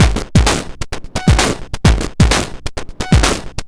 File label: Crushed Beat 130BPM; musicradar